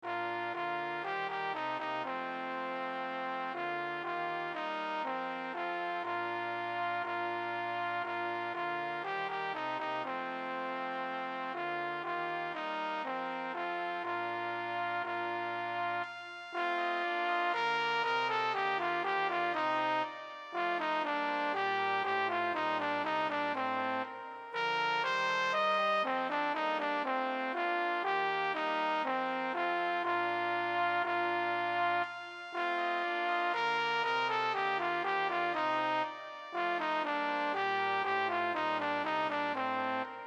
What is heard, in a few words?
ding dong mid; Ding Dong Merrily 2011 ALTO PART